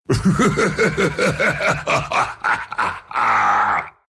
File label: laugh ru